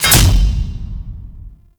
Golden Maw Attack Impacts; Ambience; Gameplay; Warframe; The War Within

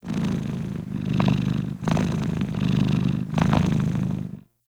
Kitten Purr; Enemies; Warframe; Catbrow

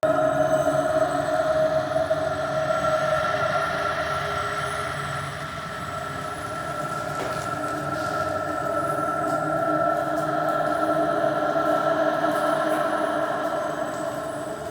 Root Cave Bed Loop, Ambience; Neathuns Root Cave

